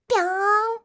pyoonn

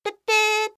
puppuu

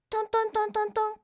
tonntonntonntonntonn

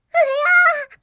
"uhyaa"; hidan

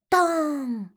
doonn

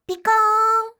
pikoonn

